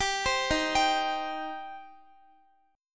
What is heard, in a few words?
Eb 6th Eb, Guitar; x,x,17,17,15,14; 4E,4A,5D,5G,5C,6F; arpeggiated